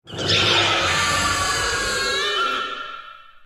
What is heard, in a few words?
Singurazort roar